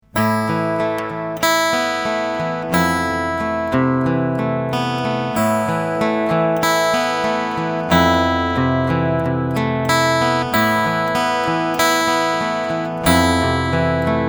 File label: picking cool Tube pur